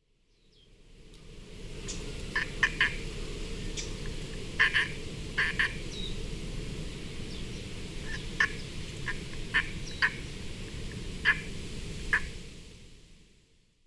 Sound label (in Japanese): haiirooshu; koe